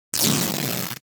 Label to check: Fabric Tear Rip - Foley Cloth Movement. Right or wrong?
Right